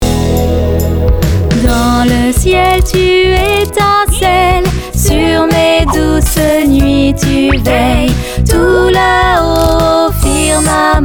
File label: SINGING French